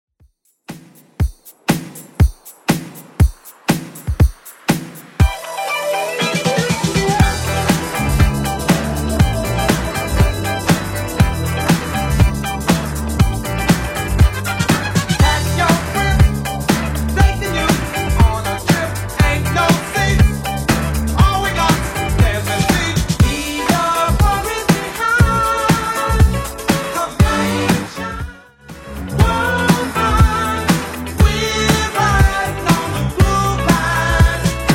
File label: 70s Redrum 120bpm